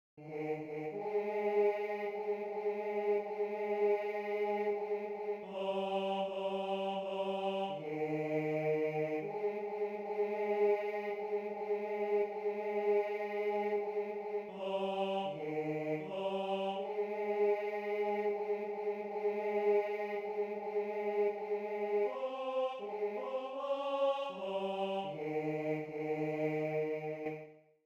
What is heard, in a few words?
Bass I; Ziacht a Stern